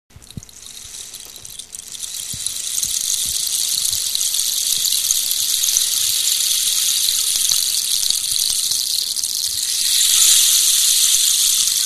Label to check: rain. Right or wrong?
Right